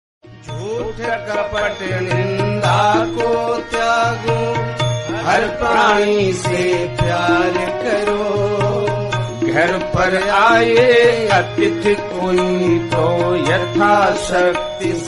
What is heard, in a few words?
Bhajan Song Ringtone